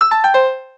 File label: Peg Disconnect; Samsung SGH-i607 BlackJack